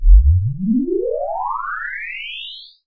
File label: FX, SYNTH FX; AKAI S-Series CD-ROM Sound Library VOL-6